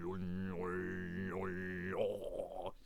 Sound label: Necromancer Attack Vocals